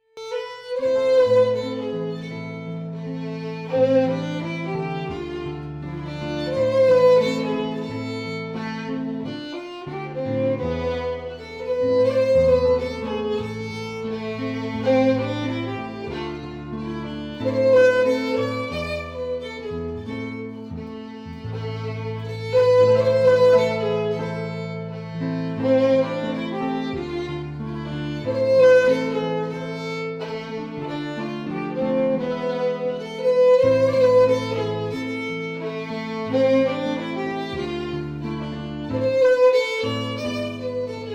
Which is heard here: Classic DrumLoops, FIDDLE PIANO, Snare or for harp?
FIDDLE PIANO